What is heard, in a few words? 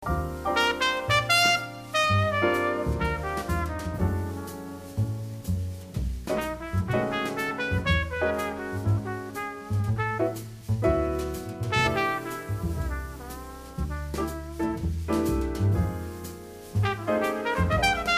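trumpet solo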